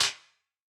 Wider Clap

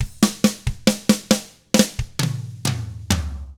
SLOW ROCK; 66SLOWROCK; Drums

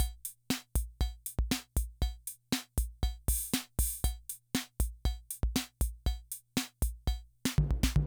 Loop, rhythm 55, classic machines

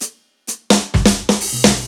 Fills 128BPM, Clubsounds

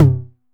909 Tom, E-MU Proteus-2000

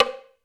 Hollow, Drum Machines Alesis D4

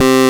SYN OBX, analog; Sound & Vision - Gigapack I CD 2 (Roland)

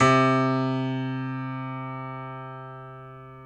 PNO, Grand Pno, Roland; Club-50 - Foundations; Grand Pno 3

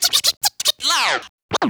Vocal Scratches 140BPM

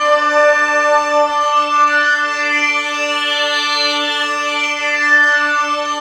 D, Drum & Bass Pads; Total Drum&Bass; 90 s Sample CDs

